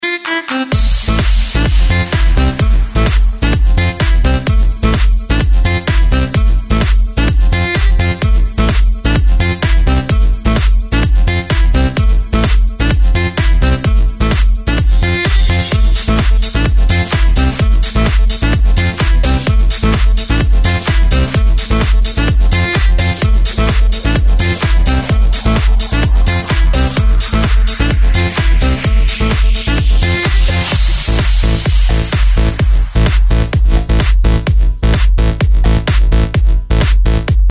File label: Saxophone